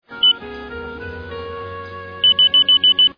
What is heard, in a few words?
Door Magnetic Alarm, Di sound; doorbellic